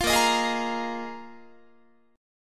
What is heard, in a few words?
A sharp 7th Suspended 4th, Guitar; strummed; chordshape; 20,18,18,18,21,21